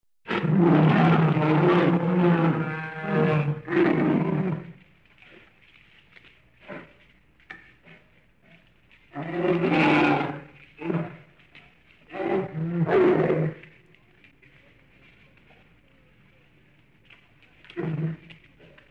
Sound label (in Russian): zvuk ryka medvedja